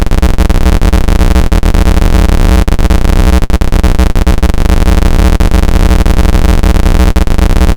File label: noise 0A